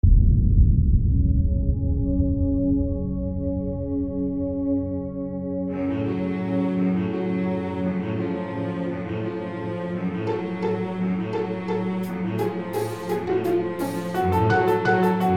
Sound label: instrumentals only